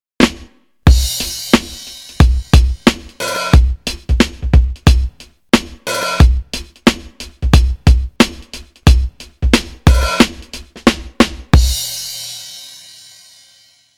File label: Funky 70s